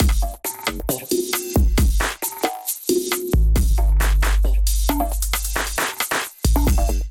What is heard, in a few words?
Machine Speak Kit groove 135 bpm, Glitch and Wash; MIDI Clips; 133 - 151 bpm; Ableton